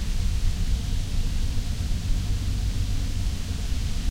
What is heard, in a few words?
reel spin; druids dream